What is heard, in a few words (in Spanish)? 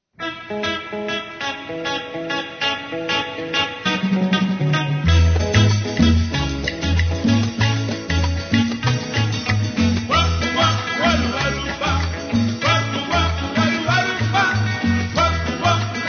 SALSA ROCKANROLLERA, tropical